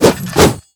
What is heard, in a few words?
Fighter Attack; Ferea